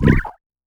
Jump; Chaser

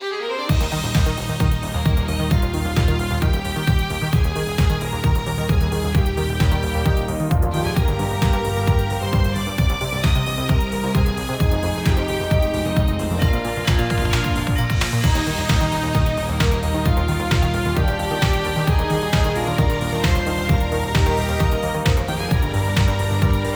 B Menu Event; dankira boys be dancing

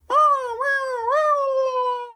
Grub Wave; Hollow Knight

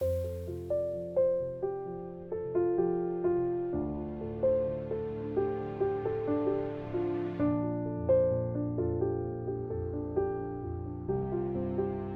Piano Loop; Cutscene; Deams Don't Stop

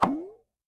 Pudding tray; Obstacle Puddingtray; hello kitty friends match; android

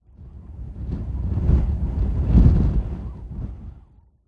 Golf Crowd

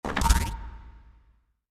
Field Detection; starbites

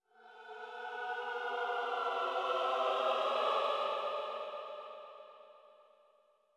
Choir

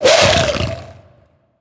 Gargoyle Horns Fly

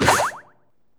Jump

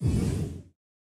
Hero Shade Dash Charge

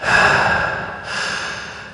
Basketball; Sfx Basketball

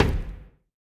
Animations Install Small

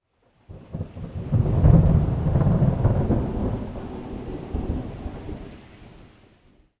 Thunder

